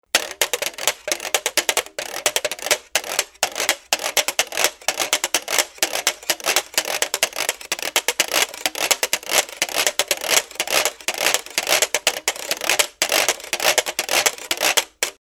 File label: Washboard